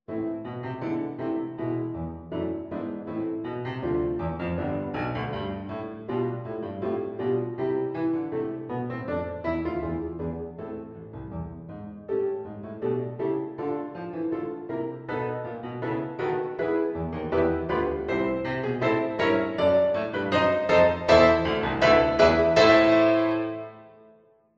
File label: Variation one from Thirteen Variations